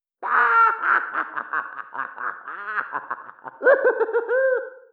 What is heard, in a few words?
hahahah; don t be afraid the first toy